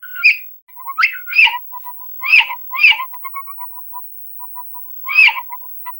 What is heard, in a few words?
thumps 80 Hz